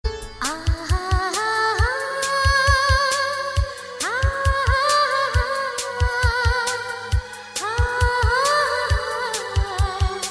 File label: Sweet Humming Tune